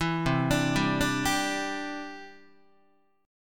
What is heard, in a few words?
C Major Add 9th, C, down, Guitar; arpeggiated; JGuitar